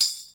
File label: tamb, Tambourine; Idiophones; Struck Idiophones; Tambourine 5